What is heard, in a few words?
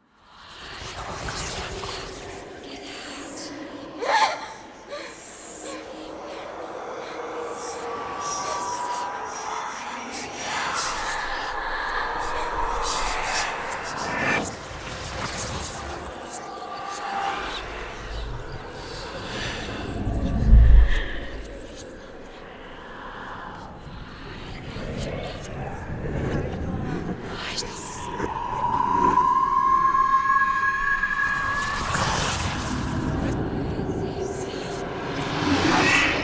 ambient